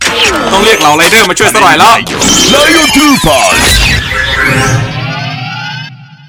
summon; yuusha rider; diend

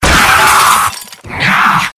stabbed; freak fortress 2

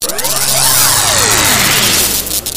instant teleport; freak fortress 2

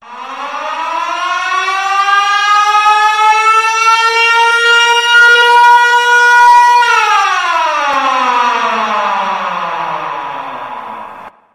siren warning; ff2; siren head

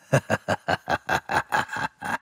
teleport; ff2; ghostface

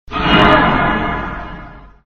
teleport; freak fortress 2; corrupted snow

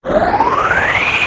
inhale; freak fortress 2; kirby

